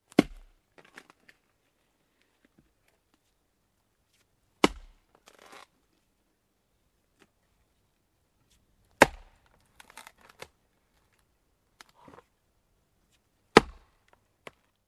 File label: Slow deep choping